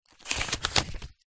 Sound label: household book very small page turn; zapsplat